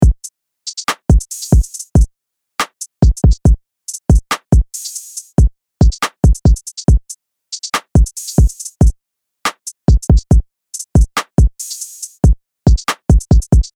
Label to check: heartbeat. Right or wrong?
Wrong